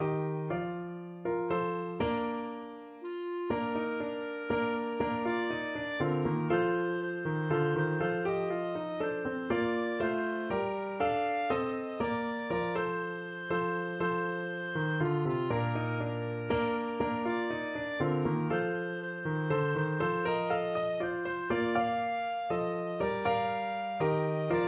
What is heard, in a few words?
cockles, clarinet